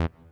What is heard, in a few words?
beep button; 18 button and menu sounds